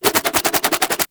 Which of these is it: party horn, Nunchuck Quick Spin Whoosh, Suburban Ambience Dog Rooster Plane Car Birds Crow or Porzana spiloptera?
Nunchuck Quick Spin Whoosh